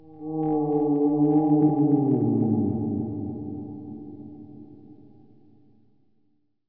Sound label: zombie